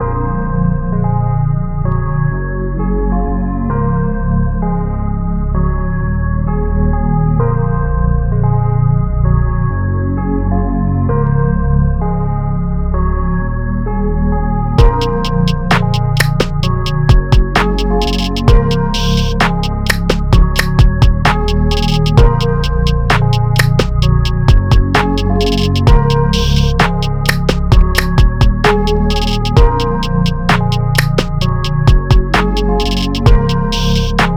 type beats bass boosted beats car music gangster beats